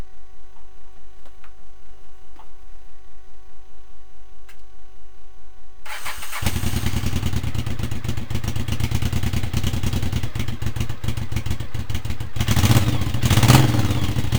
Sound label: Shadow exhaust